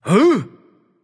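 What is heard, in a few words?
nonverbal surprised; smite; voicepack; merlin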